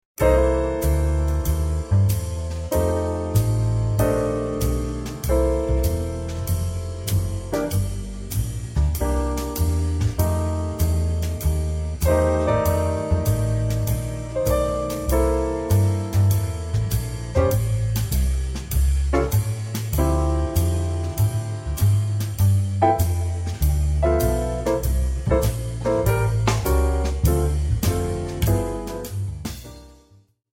Blues Trio slow

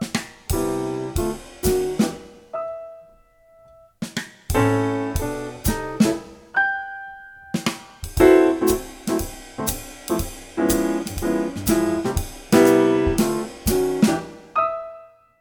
PnoDr

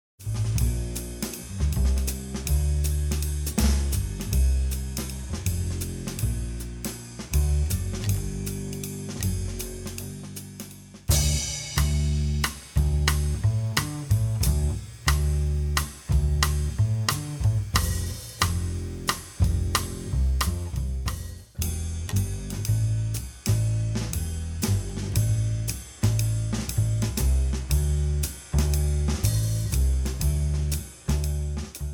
Odd Meter Bs Dr

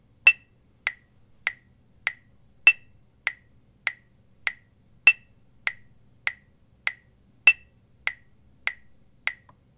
Quarter Notes

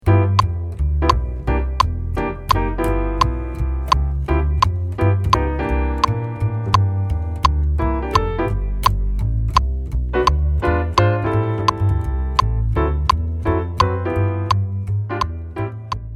Groove click

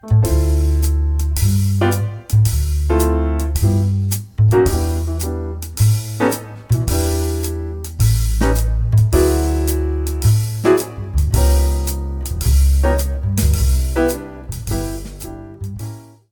Trio

